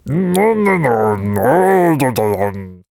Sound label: Vampire Attack Vocals